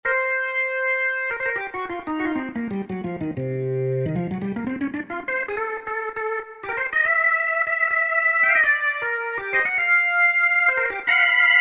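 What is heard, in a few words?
Tone Wheel Solo; Roland JV-2080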